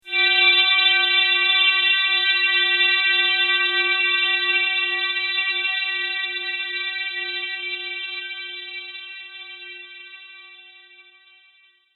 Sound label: The Chime Of Terror; Evil Sound Effects; horror sound effect; Andrew Fleming